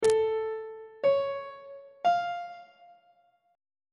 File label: a augmented triad chord intervals; treble clef